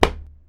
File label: click; Ludum Dare 46; death